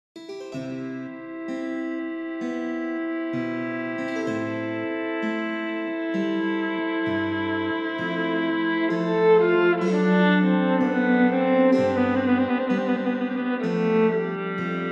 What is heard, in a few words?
Orchestral Suite No. 3 in D Major, Air on the G String (Arr. For Viola; Strings; BWV 1068; II. Air